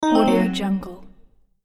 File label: purchase error